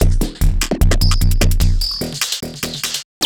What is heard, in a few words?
Beat, Drum N Bass